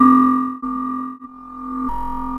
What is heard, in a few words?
BASE, ELECTRONC